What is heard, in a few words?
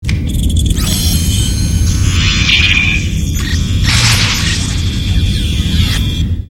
romulan device